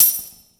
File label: Pickup Coins; SFX Kit; Pickups